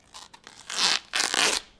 Tear Corn Husk; SFX Kit

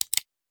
Hand Gun Foley; Sound Ideas; Guns

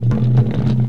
Roll Wood Barrel; SFX Kit; Foley - Misc

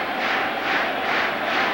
Baseball Crowd Clap Loop; Crowds

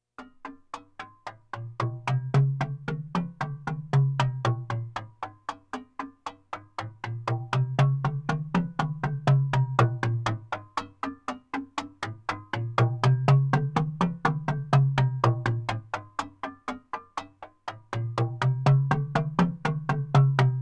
Percussion Bongos Tympani rolls Gongs Drums

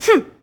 Boxing Female Punch Breath

